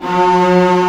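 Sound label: STR C0 FF, strings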